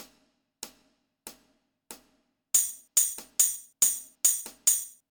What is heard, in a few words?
quarter note tiplets with count and click